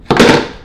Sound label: phone dropping